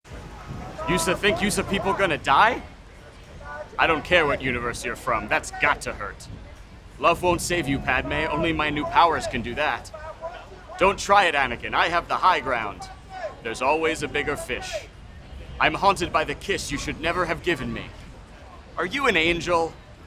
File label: Crowd Boom; cedar-demo